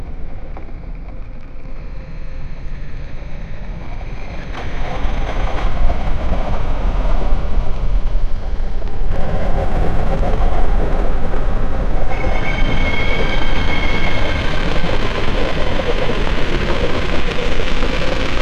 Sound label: sounds in pripyat chernob, ruins